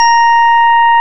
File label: KURZ ORG 1-A, organs; Greytsounds VOL-1